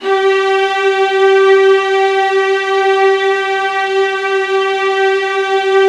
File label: VIOLINS L, Synclavier Strings; ILIO